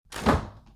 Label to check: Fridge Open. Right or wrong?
Right